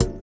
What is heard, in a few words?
VIBRA; Spectrasonics; Metamorphosis